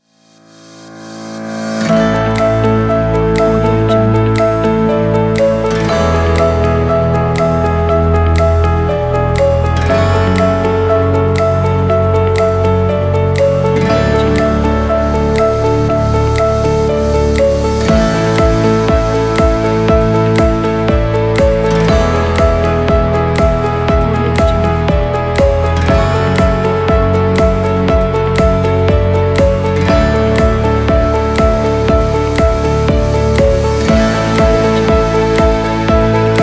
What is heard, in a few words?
positive and inspiring